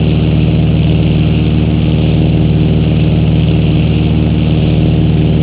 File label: Spitfire Internal, Aircraft; combatfs